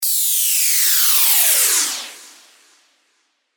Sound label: Downlifter; fx; revealed serum fx vol 2